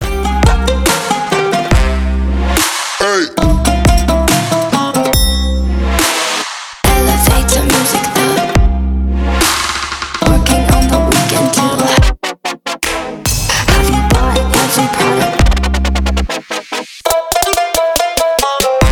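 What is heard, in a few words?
ringtone eastern future bass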